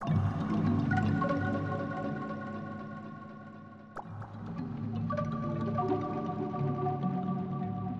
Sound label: Suspense Bubbles, cinematic soundbeds; musicradar